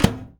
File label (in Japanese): 電子レンジドア開閉